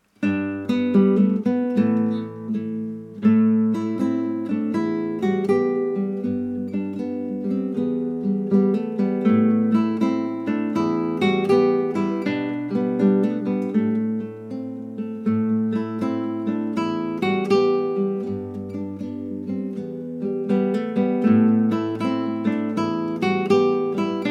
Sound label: Irish slow